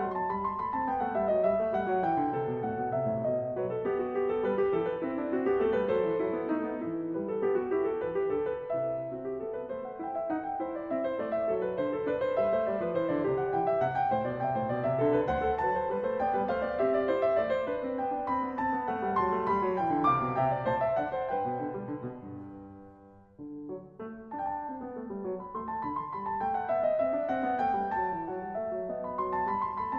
Goldberg Variations BWV 988 Var.3 Canone a Unisons a 1 Clav